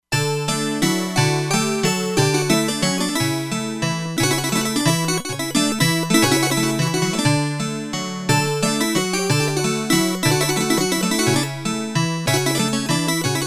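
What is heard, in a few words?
Harpsichord, E-mu Proteus-2; Factory Presets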